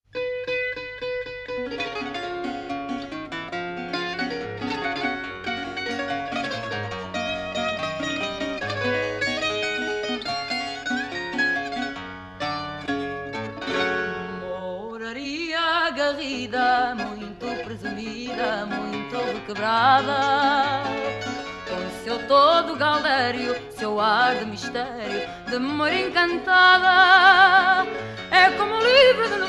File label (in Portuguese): MARCHA